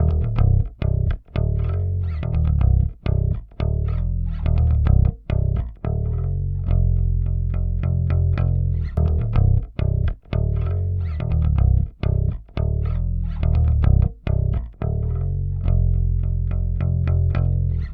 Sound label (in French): basse